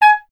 clarinet G#, Solo Instruments; Miroslav Vitous - Solo Instruments VOL-1; 90 s Sample CDs

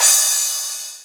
Crash, Drums Cymbal

